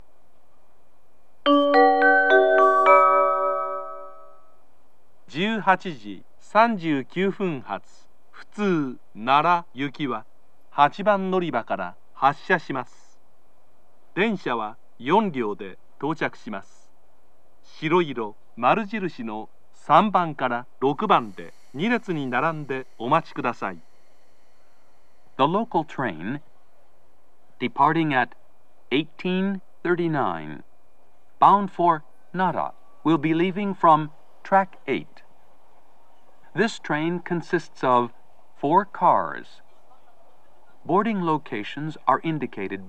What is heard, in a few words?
local, kyoto, station; nara; jr-west